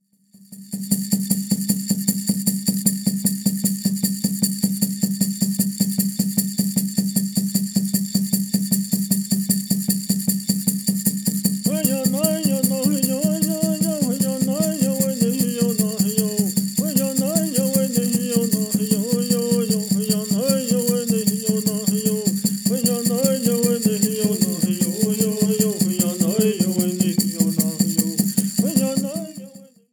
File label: Vocal Track